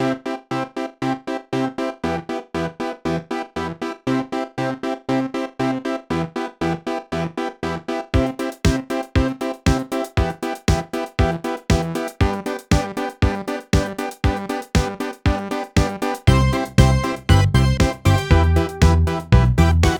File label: happy electro 80s; archibald editions